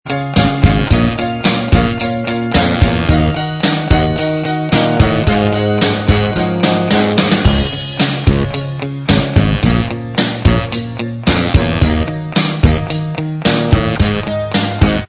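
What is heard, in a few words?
instrumental, backing tracks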